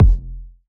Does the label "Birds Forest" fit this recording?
No